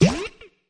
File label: fx drum, Electro FX